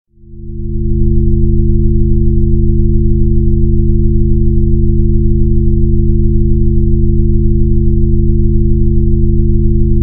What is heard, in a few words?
Brainwave Enhancer High Gamma; Heightened Perception